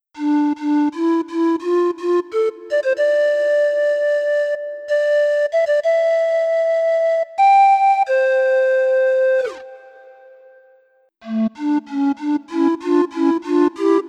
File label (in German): Panflöte